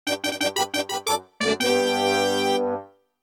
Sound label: Race Fanfare; N64; SUPERMARIO64